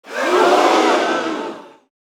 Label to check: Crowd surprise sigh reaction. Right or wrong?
Right